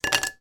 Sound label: food cracker put down on plate